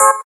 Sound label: gayageum, keytone